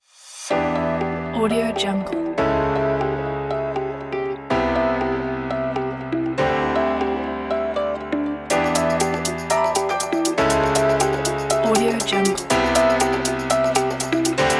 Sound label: disco funk